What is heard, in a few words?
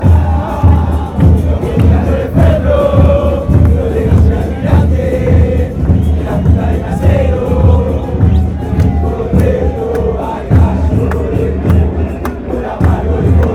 deportivo merlo chant